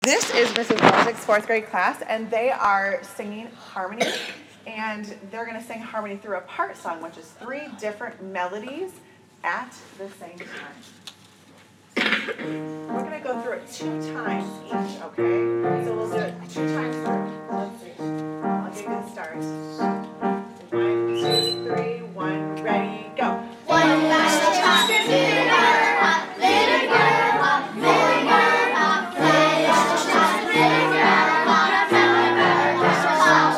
bottles of pop

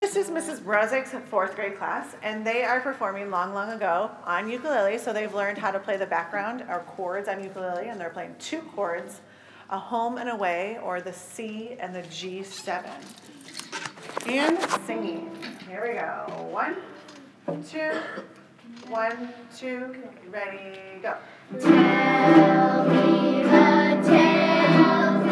long long ago

